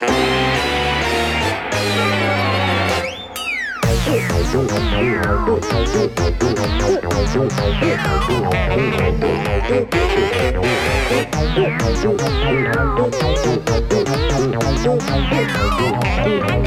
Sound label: Battle Low Health; Bowser Jr